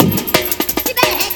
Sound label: break loop 175, Breaks (Loops); 'Effection' samples